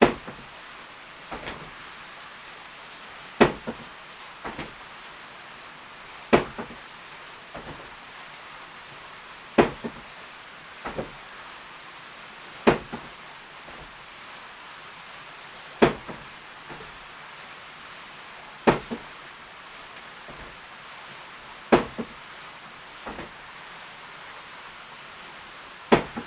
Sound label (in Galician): Batan Vimianzo batendo